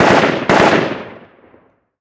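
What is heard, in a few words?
gun ak47 medium; Call of Duty Modern Warfare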